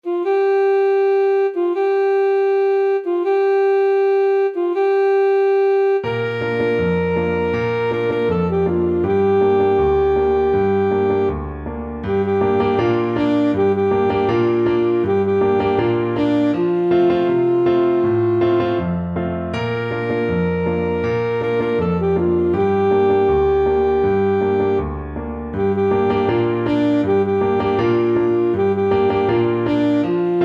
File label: magalina zoula, saxophone